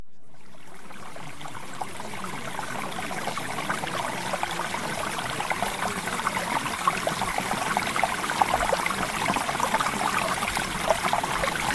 Water; CLEAR SKIN

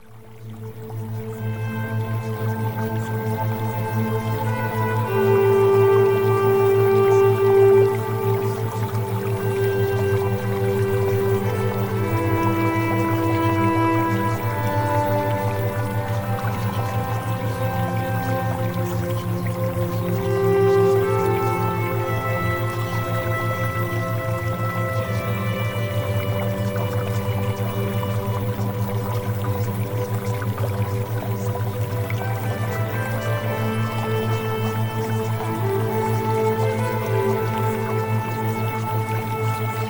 Music Theta Waves; OVERCOME ADDICTION; Premium Subliminals

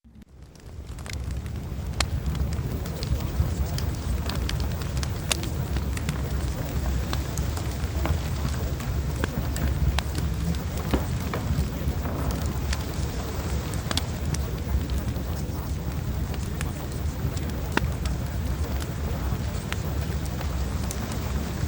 Fireplace Sample; Spiritual

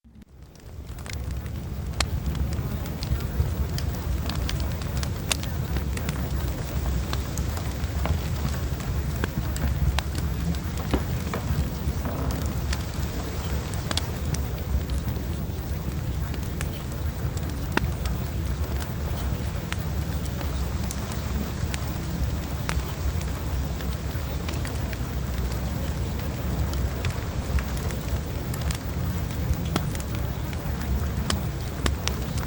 Fireplace

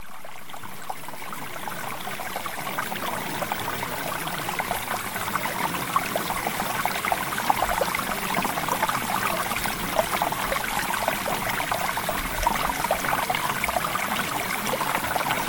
Water

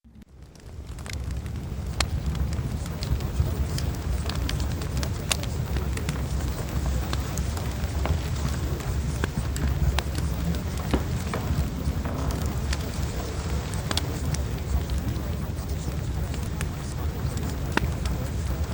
Fireplace

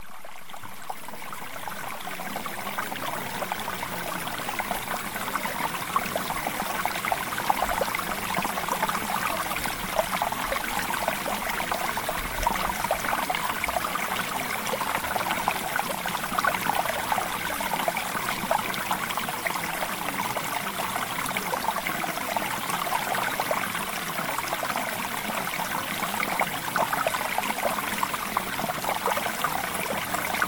Mountain Stream Sample

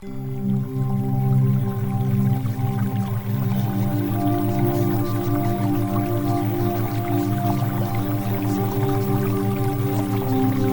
Music Theta Waves; Premium Subliminals

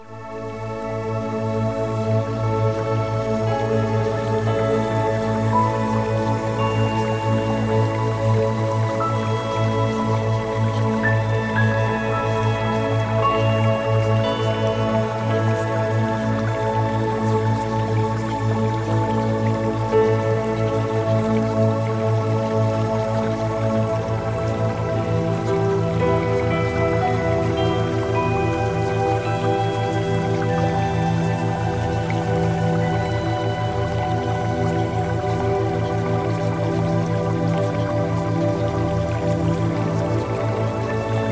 Music Theta Waves; Premium Subliminals; Success With Men

